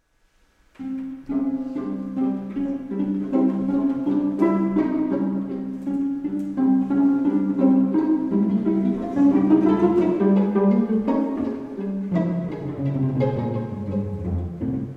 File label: waltz; kurtag; hommage a gyoergy kurtag